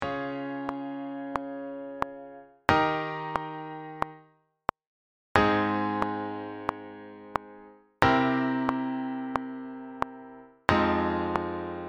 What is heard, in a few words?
LFO